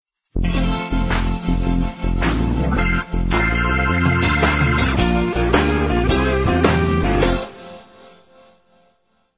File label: bluesy; 93 bluesy jingle